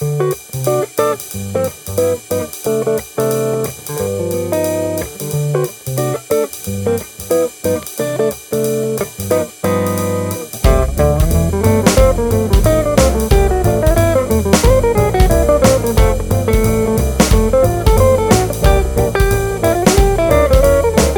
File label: jazz swing